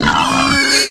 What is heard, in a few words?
boar Death